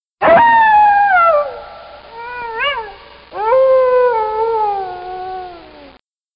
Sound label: COYOTE, MAMMIFÈRE animal; mondeduvivant